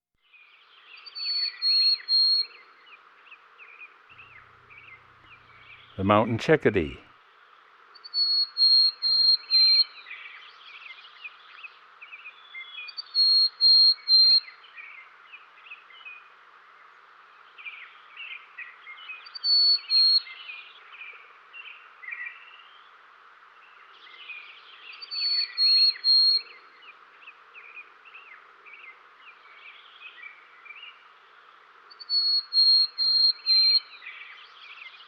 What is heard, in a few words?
Mountain Chickadee; Western North America; Dan Gibson; Wildlife Identification By Sound